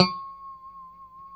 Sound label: G4 pick harmonic, Guitars; Spectrasonics; Hans Zimmer Guitars VOL-1; JC-HARMONICS